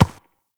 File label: Ram Step Walk Dirt; Animals; Duviri